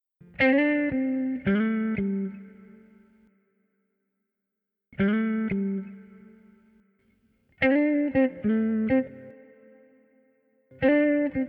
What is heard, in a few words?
guitar riffs